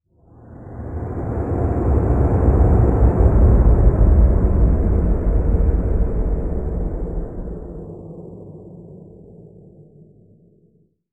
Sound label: tele sound; svencoop